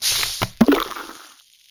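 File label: prep, concussion bomb; midnight guns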